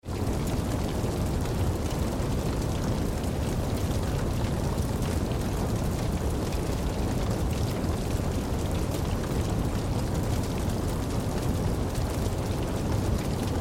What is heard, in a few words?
Large fire wooden house burning down